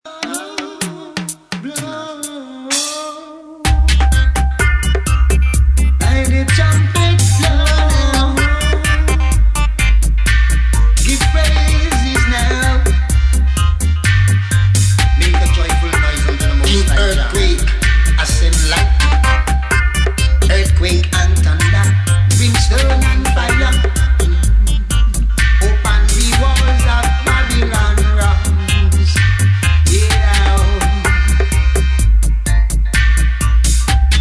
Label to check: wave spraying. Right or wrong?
Wrong